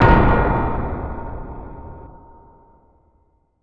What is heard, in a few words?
tyre, Impacts, Hits & Booms; Distored Reality